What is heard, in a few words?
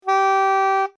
Soprano; Acoustique